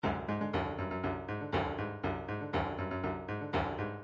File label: drums